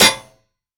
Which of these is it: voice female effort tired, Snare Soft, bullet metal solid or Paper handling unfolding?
bullet metal solid